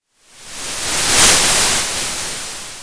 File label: Sound of waves crashing on a shore